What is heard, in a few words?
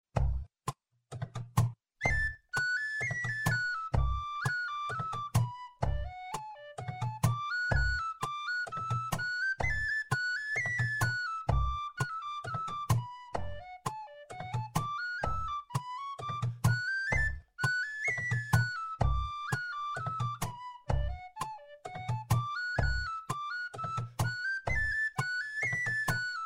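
whistle percussion medium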